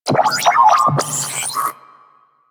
Ship Computer Terminal Chatter; Ambience; Props; Warframe; Corpus Ship Remaster

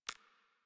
UI Corpus Theme Focus; DE; Warframe

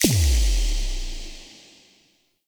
Thorn Bow Arrow Impact Enemy; Weapon Impacts; Player; Bows; Envoy Fey Bow

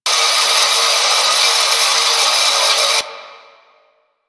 Death Exhaust Loop; Warframe; Grineer; Gas Burst Death

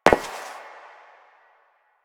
Step Metal; Warframe; Koumei

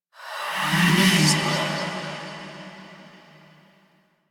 Hand Compass Vocal; Warframe; Duviri

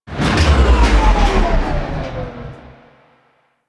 Elevator Stop; Ambience; Warframe; Infested; Golem Trial

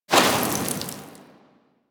Sword Elemental Fire Whoosh; Warframe; Teshin; Weapons

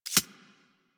Arachnoid Micro Step; Warframe; Corpus

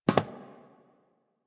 NPC Step Default, Footsteps

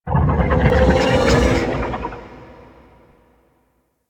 Move; Ambience; Golden Maw; Warframe; The War Within

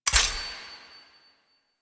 Collectible Fragment UI Pip; Warframe; Duviri

